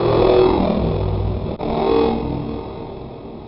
Industrial Bass, Drum N Bass; Sonic Foundry (Sony Creative Software); Knutrix Drum & Bass

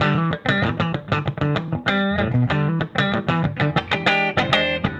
Guitar, 70s Analog Funk

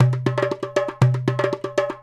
Tablah, Roland BK-5; Loops & Phrases

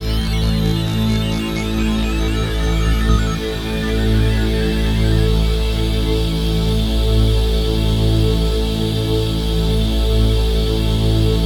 PAD, Dance Mega Synth

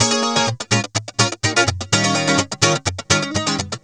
GUITARS 125

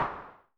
SN Filtered, Vermona Mono15 Drums Snare

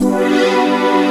pad, Drum & Bass, 140 BPM; Ueberschall; Drum & Bass Resonance

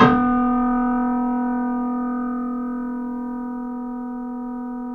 Wurlitzer EP200

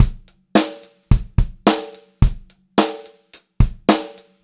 Pop-Rock Drumloops 108 POP BEAT; USB Soundscan vol.37; AKAI

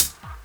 KIT, Dance Mega Drums; Best Service

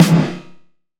Snare, Gated Reverb; musicradar

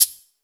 PERC, 150 BPM; Ueberschall; Drum & Bass Resonance

